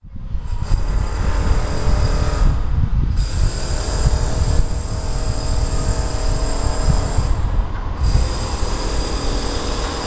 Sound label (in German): es brummt